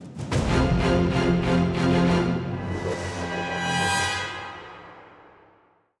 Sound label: 190bpm; Legends of Pegasus; windows gamerip 2012